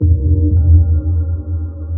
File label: sonar Ping Air Far Shuttle; Barotrauma; Barotraumatic; Carrier